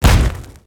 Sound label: tower fireball impact; npc; smite; phoenix